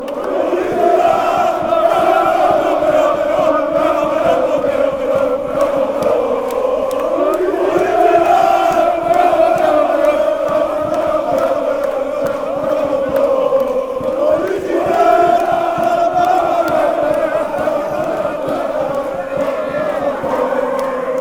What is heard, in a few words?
popopo